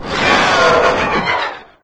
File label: die; doom3; archvile